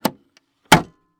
Electronic Machine Wood Record Player Closing Slam; AdobeCC